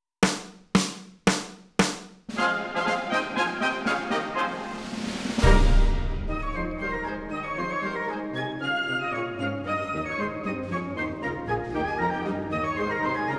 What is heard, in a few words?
Sea Song playalong